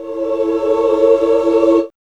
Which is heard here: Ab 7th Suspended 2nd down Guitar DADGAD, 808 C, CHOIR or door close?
CHOIR